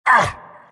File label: abys pain; abyssal underlord